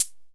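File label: Fricke MFB-501 hi-hat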